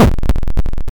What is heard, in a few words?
Player Death